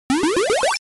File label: Power Up